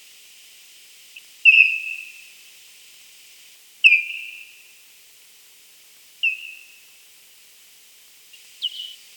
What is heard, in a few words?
BAT SOUND